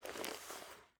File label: generic cloth movement, weapons handling